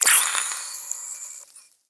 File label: Acid Burn Sizzle; Blastwave FX